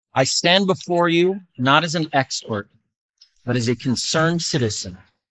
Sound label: Carnival